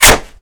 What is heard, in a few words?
bullet canvas, impact; weapons; Modern Warfare